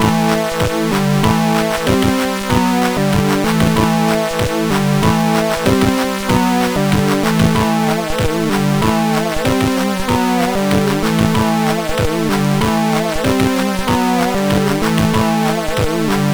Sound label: New Digi, MOS 6581 R4